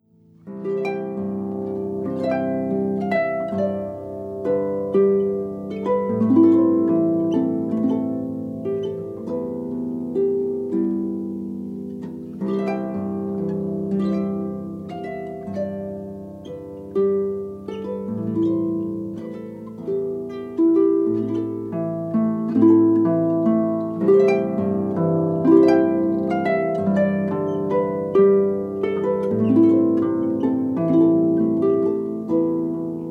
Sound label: Sample Folk Tunes, harp; folk medley